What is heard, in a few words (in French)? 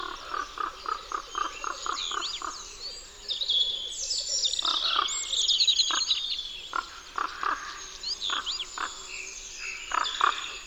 Grand corbeau; Isere; Concerts naturels de l'Isere; Studio Les Trois Becs